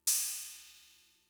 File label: Cymbal; Fricke MFB-522